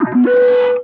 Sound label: Aliens; SFX